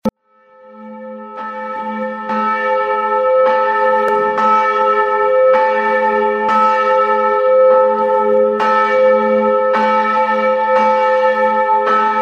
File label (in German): Glocke